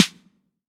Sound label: bright snare one shot f key, snare drum tuned to the F note; snare-drum-samples